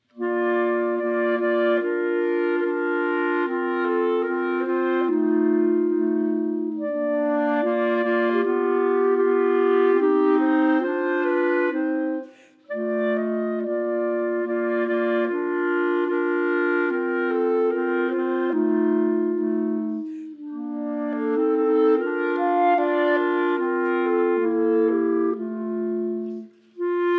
Entracte Chamber Music for 3 Clarinets complete version; Schubert